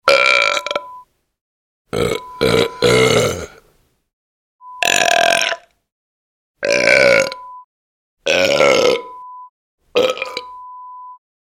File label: BIG BURP COLLECTION sound